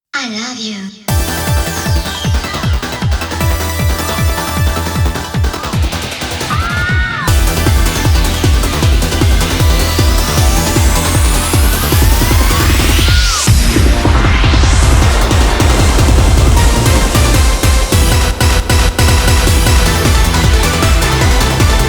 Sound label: Instrumental